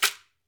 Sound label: AMAZON SHAKER, Latin Shakers